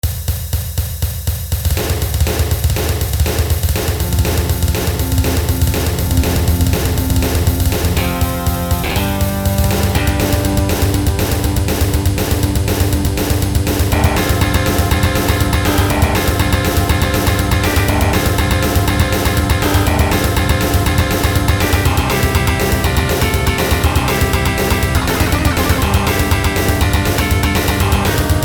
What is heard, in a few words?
main melody; battletoads; level 3; turbo tunnel